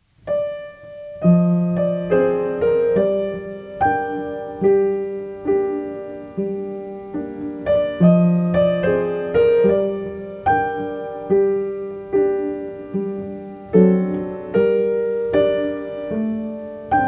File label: Simple Sad Tune